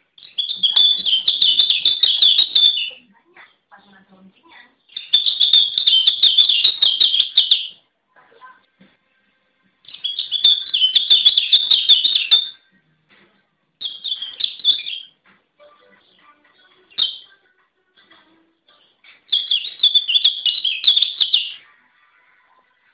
pleci lombok song

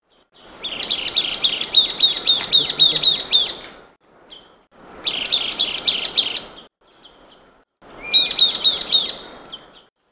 gelatik sultan song

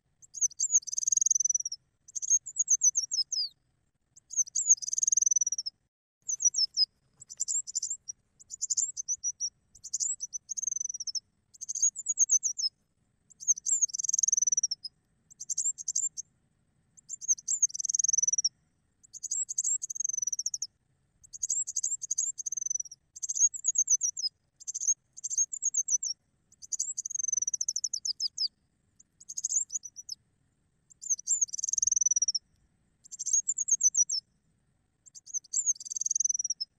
suara burung madu polos

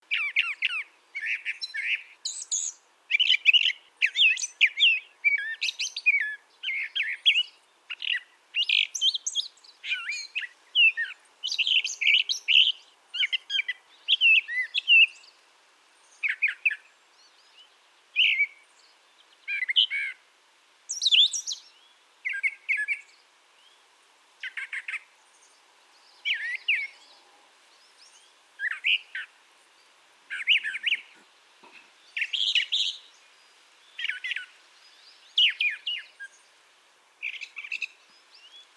suara brown thrasher

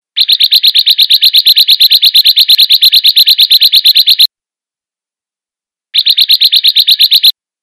ciblek sawah

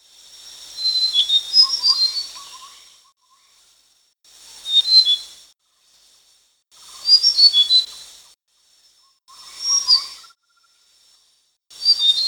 sikatan kalimanan

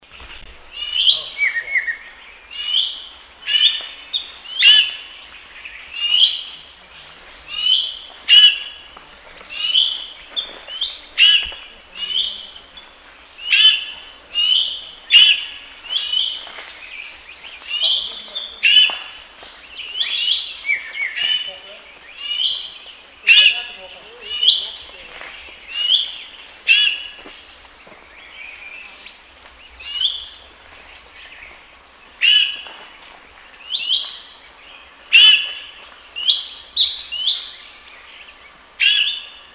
suara call song ekek layongan

